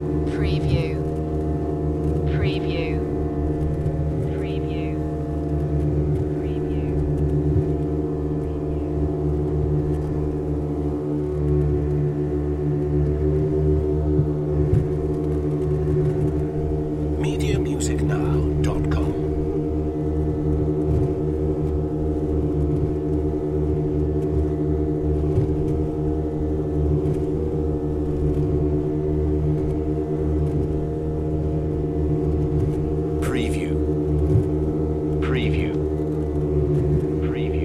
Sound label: motor boat ride; Boats